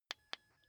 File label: car key button click signal